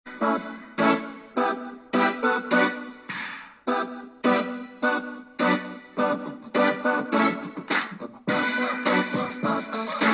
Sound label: digitar